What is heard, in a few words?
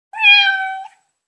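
loud meow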